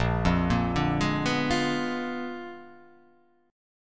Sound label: A sharp 7th Flat 9th A sharp down